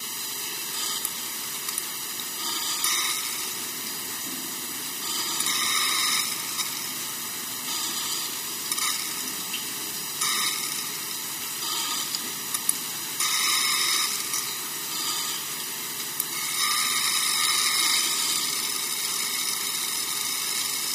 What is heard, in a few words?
Berry Fizz Loop